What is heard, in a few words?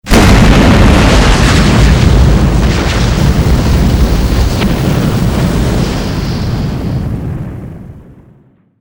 big impact explosion